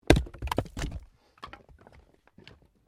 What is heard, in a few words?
Wood Log Fall; Material components for constructing more complex sounds; Materials